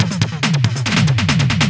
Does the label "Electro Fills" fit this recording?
Yes